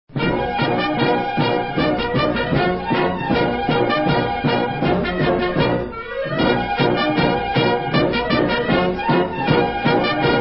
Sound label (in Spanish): Diana floreada